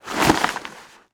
Short foley

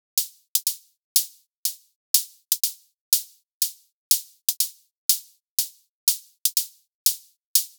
Hat Loop 122, Afrohouse; Afro Drums; Kits; Ghosthack; Drum Mega Bundle

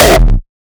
kick oneshot monster E; GhostHack

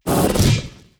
Jolt Standard

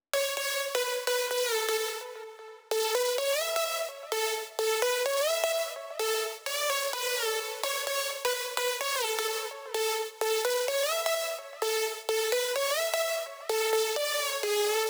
128 BPM F# Min Lead; House; Drop Loops; Cymatics; Glider

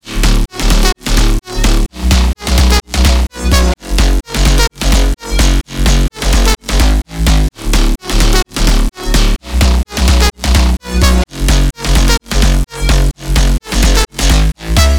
128 BPM F# Min Sidechained; House; Drop Loops; Cymatics; Mothership

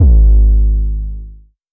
808 - G#; 808s; Cymatics; Cobra Hip Hop Sample Pack

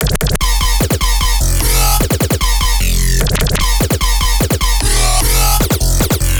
Bass Loop F, Dubstep, 150bpm; Quantum; Ghosthack; Dubstep Sound Arsenal

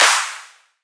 Riddim Snare Clap

